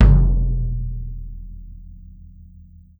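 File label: bass drum open; E-MU Producer Series Vol. 7 – Old World Instruments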